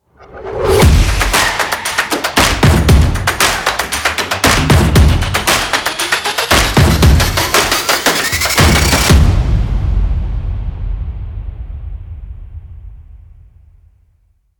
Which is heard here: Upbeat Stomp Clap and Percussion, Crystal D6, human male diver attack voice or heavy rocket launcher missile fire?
Upbeat Stomp Clap and Percussion